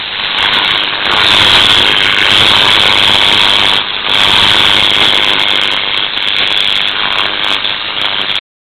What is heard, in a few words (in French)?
arc HT